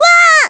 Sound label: Damage Fly; SSBBrawl; Nana